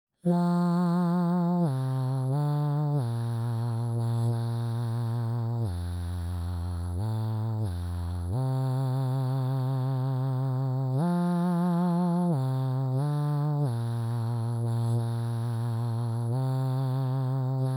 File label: Bass